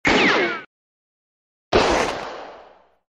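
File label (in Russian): выстрел комично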